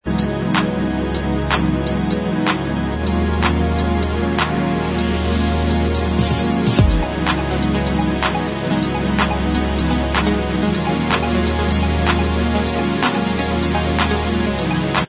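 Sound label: instrumental, backing track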